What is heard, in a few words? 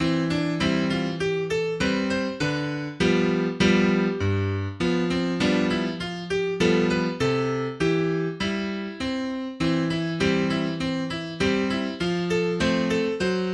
Scales and Arpeggios